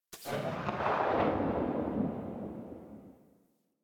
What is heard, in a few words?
Elite Shotgun Tail; Warframe; Weapons